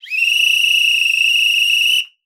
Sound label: Coach Whistle Long